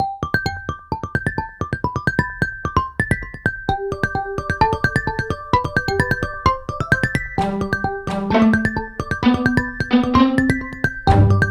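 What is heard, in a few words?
non-irritating ringtone for alarm clock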